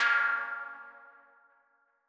Mono DBeat A#3; KG MegaSaur

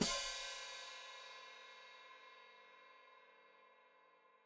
Hat Closed Open, Hi-Hat

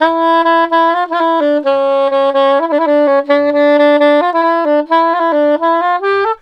SAX, Balkanish Traditions

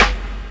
SD, dance drums hip

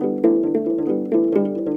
HRP AF HRP, Afro Harp Phr; Roland LCDP11; Africa VOL-1; Afro Harps

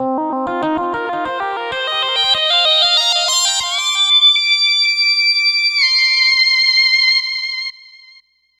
80s Lead; Dr. Fink's Funk Factory

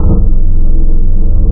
FAIRLIGHT SOFT, wavetables, Classic Synths

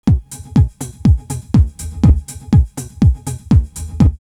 909 Deep House 122, drumloops, DrumMachines; X-Static Goldmine

